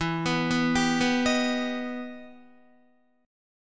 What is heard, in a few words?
E Minor Major 7th, Guitar; EmM7 arpeggiated; 11,14,11,12,11,11